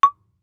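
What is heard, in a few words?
Marimba deadstroke fortissimo Db4; MIS Pitches - 2014; Percussion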